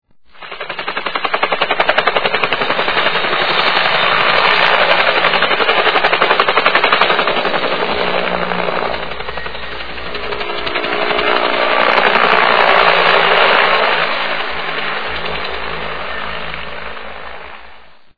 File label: flyby